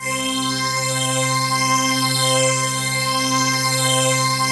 PAD

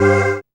dance chord

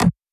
perc; Anthemic.Electro; drum hits

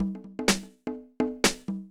DEEPHOUSE BEATS 125

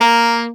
A SAX POR A#2, Alto Sax Portato; Orchestral; VarazdinOrchestral

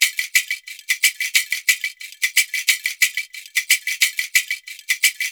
ETHNO PERCUSSION 90; ethno2

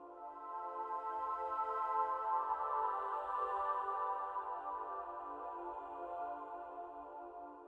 SYNTH PAD ETHNO 125 A, Sphere

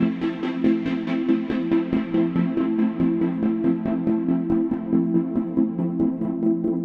techno pad 140; Techno2; Keys

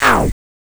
SNARE, INDUSTRIAL

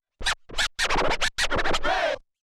OLDSCHOOL SCRATCH 96

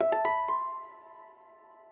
ethno, 125, A

